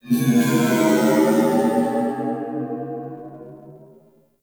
Ship Exterior Space Drones; Warframe; Corpus Ship Remaster

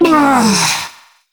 Struggle Short; New War

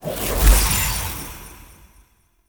Team Heal Cast; Enemy; Sinecure; Melee Grunt Dual Agent; Abilities

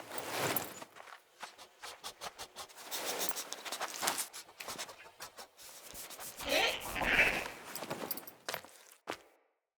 Interact Pet Beaver; Foley

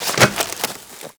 Generic Roll Ice; Warframe; Characters

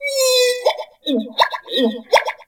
Valley Night Critter; Warframe; The New War; Drifter Camp Valley

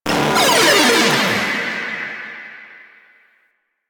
Arcade Fail; Nineteeen Ninety Nine; Snake Game

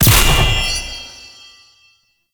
Knight Charge Ability Cast; Warframe; Duviri; Dax Knight; Abilities

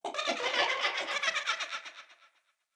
Ambient Giggle Multiple; Sproutfolk; Level Events; Midrath

